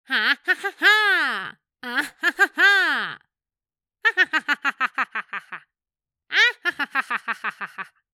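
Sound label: Alien Laugh; Gaming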